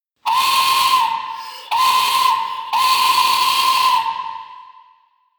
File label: death whistle